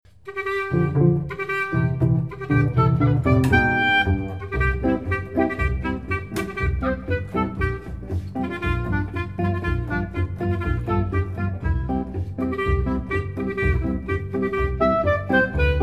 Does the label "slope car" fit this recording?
No